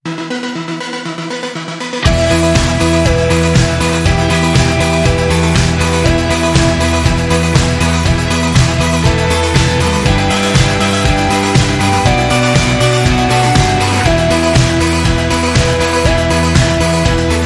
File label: Jingles